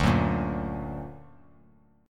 D Augmented D down; D+ strummed; JGuitar

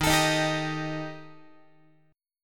Eb Major Flat 5th Eb down Guitar; EbMb5 strummed; x,11,12,13,11,14; 4A,4E,5A,5D,5G#,6C#; JGuitar

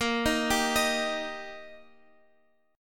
G Minor Sharp 5th A sharp, chord

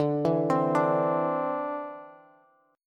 D 7th Suspended 2nd down Bass; D7sus2 arpeggiated

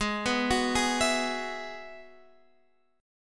Ab Minor 7th Sharp 5th, Guitar, Drop D; x,11,9,11,9,12; down

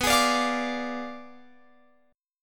B Diminished 7th, down, Guitar, Open F; strummed

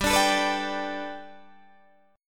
G Major Add 9th Guitar Open D, chord

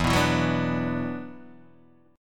E 7th Suspended 2nd, down, Guitar, Open D

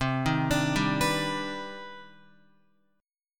C Minor Major 9th C Guitar 4F,4A,5C,5G,5C,6E; arpeggiated; JGuitar

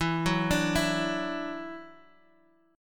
E 7th Suspended 2nd, down, Guitar, Half Step Down

